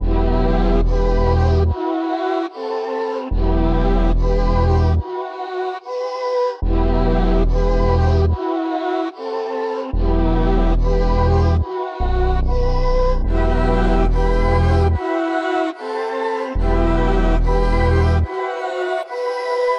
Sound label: 145 BPM E Min, Drill; Flows